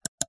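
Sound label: computer mouse click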